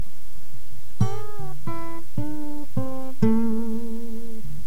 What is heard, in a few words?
blues thing, guitar; blues thing i wrote